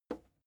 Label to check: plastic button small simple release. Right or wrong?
Right